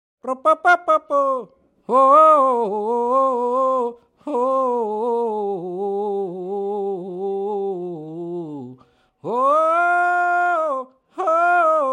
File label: south african singer on the wailing tradition